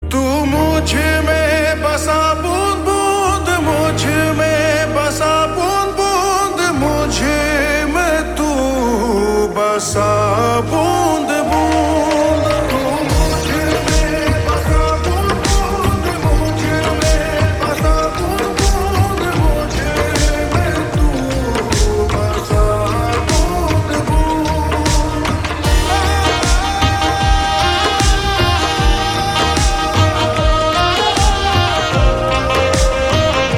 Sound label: Ringtone Slowed Reverb